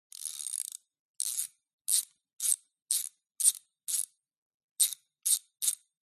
tool ratchet spanner turning interior ambience multiple takes; Sound Ex Machina